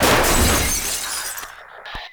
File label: Destroy Television; Warframe; Ambience; NineteenNinetyNine